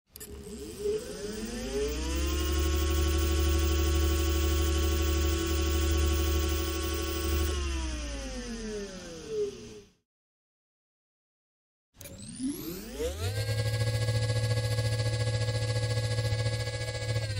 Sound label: scooter; حرکت اسکوتر